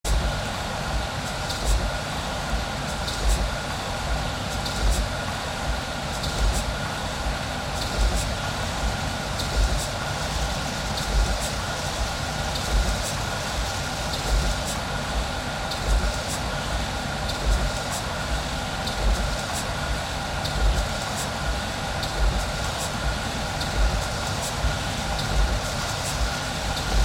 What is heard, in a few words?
Noise Electronic Music